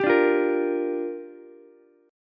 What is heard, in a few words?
G Minor 7th, Ukulele; Gm7 strummed; JGuitar